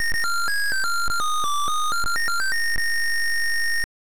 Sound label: Title Beeper; Turbo Esprit